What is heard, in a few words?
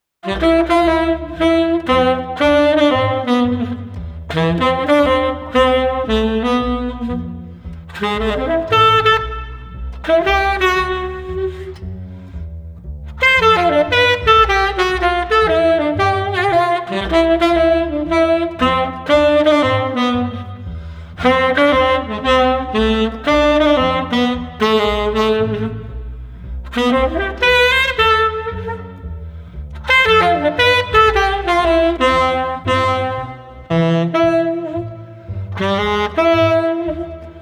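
Street Sax Combo Cheerful no Drums, 115 bpm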